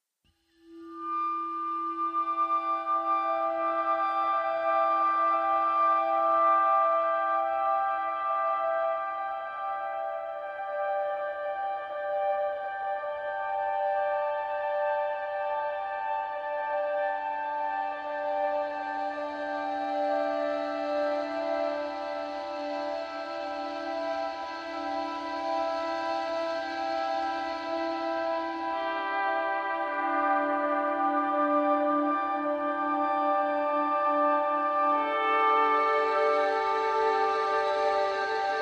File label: Ambient